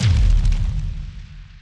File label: MOVE; C64 Dreams; Multi-Sound Default